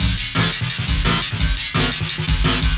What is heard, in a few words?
Flange FX, Loops, breaks; M8